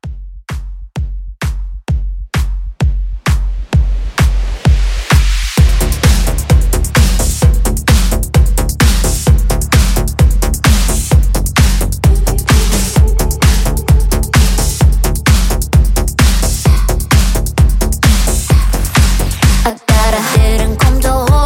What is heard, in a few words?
Club